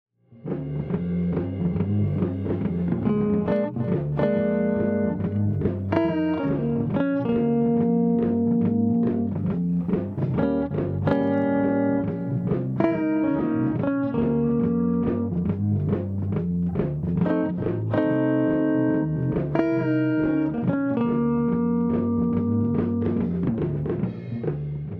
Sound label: One Above Blues Lick